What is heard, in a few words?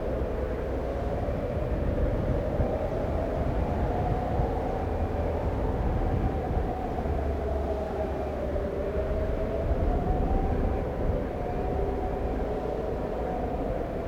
Gunship Cavalry Ambience; Lego Star Wars (PC)